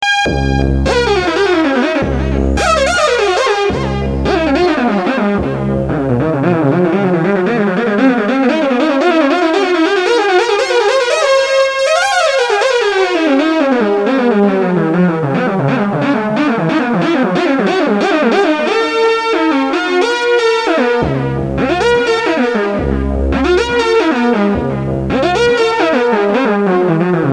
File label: better tracking vco; analog synth